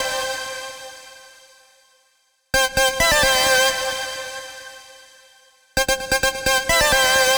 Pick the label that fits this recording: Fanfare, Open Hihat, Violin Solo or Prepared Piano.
Fanfare